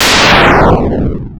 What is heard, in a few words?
thunder